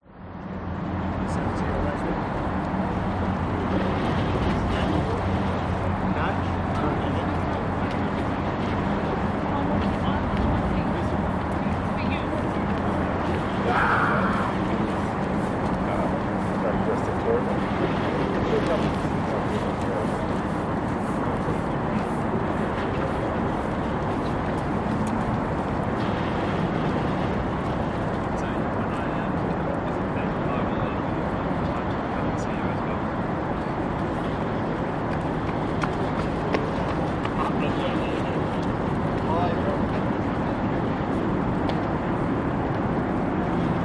public places cuty esplanade by harbour bridge pedestrians runners; sydney; dist traffic